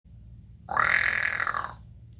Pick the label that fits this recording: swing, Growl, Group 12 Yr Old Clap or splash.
Growl